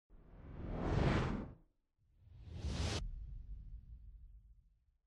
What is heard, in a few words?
intro animation